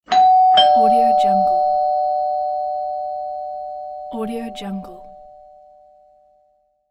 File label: door bell